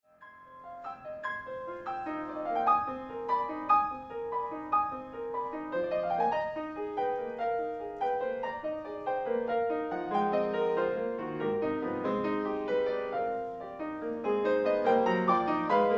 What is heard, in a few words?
Sax Fantasy